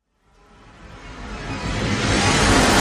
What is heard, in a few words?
Tin Noise